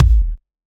Kick, Drum Hits

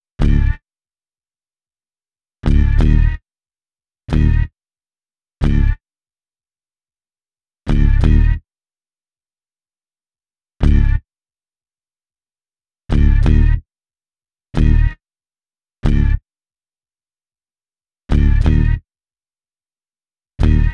Bass, Hip-Hop

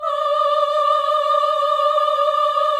VIB F D5B -L, Symphony of Voices; Spectrasonics

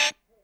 Hat Hi Speaker, Stylophone Beatbox

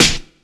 Misc Snare; Mo' Phatt; Digital Sound Factory Mo' Phatt

